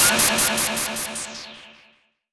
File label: FX Impact; Vengeance Samples